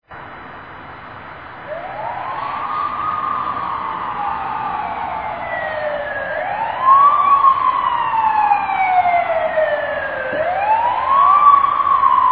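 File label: siren ambulance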